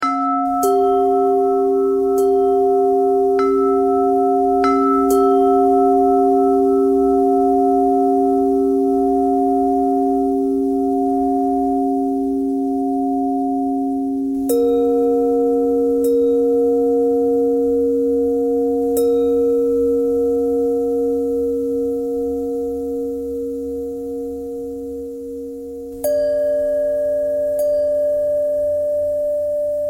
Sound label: bell thread pentatonic